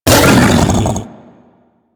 Feral Vocal Finisher Tackle; Enemies; Kubrow; Grineer; Warframe

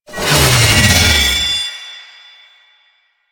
Dash Charge Up; Warframe; Lasrian; Lasrian Soldiers; Abilities; Butcher

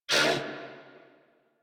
Pain, Female; en; Warframe; New War; Narmer Grineer

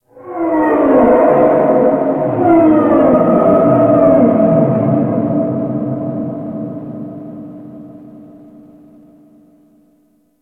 Ocean Whale Call; Warframe; Grineer Ocean

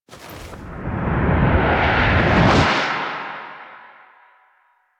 Sword Grab; Cinematics; Warframe; Sacrifice; Umbra Rises

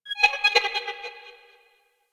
Vocalized Metal, Asteroid; Ambience; Warframe; Grineer Asteroid

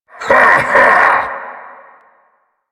Heavy Laugh; Warframe; Dax

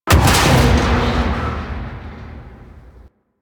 Ram Intrusion Close; Warframe; DE; Vehicles; RailJack; RailJackHazards

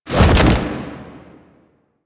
Knee Drop; Archon Death; Warframe; The New War

